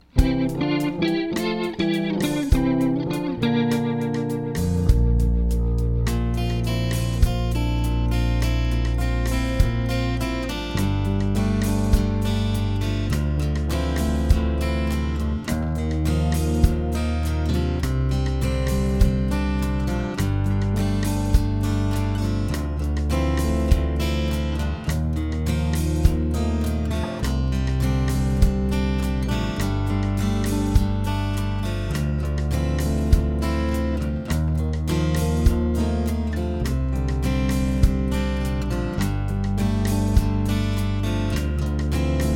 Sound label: folkslinger library